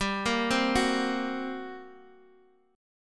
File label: G Minor Major 7th Double Flat 5th, G, down, Guitar, Open D